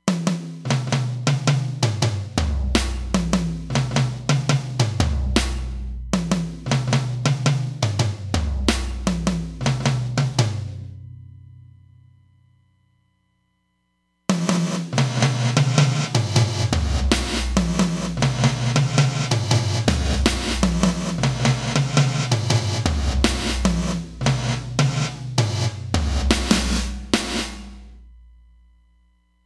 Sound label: Reverse Gate, Roland DEP-5; Factory Patches